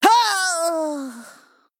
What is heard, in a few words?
Female Troll Death